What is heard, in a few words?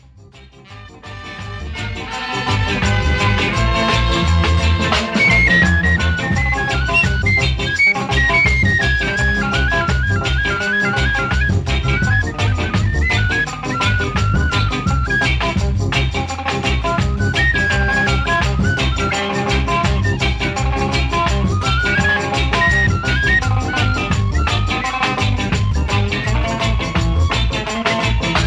Reggae